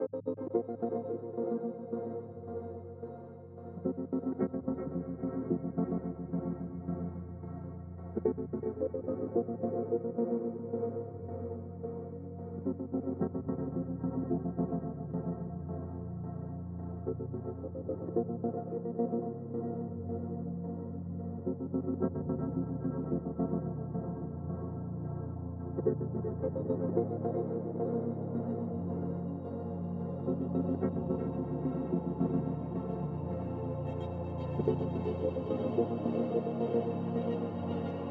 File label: Hotfilter Test